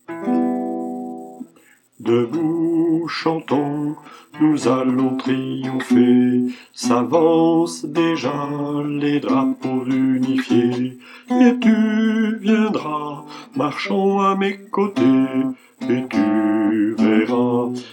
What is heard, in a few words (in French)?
el pueblo basse, chorale